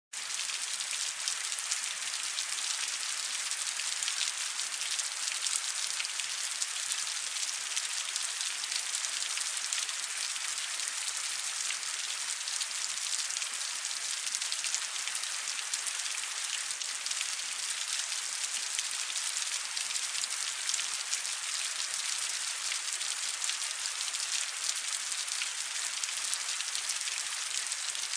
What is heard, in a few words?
Rain medium